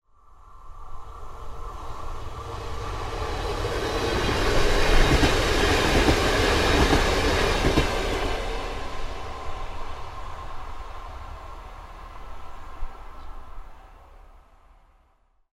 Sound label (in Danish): ic3 tog